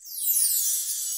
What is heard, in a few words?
sparkles; Mermaid Barista Latte Art